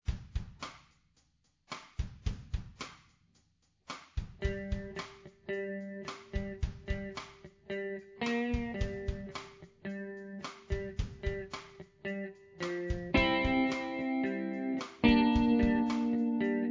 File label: Riff